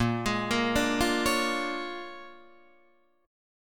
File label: Bb 7th Sharp 9th Bb Guitar Open D, chord; 8,11,8,8,8,11